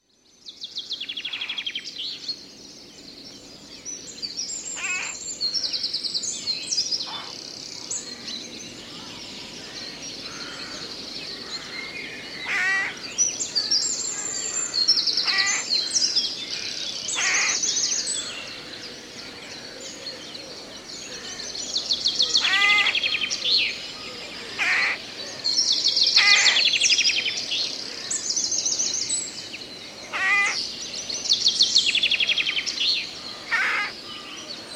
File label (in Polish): odglosy lasu